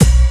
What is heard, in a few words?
HIT, Hits & Brass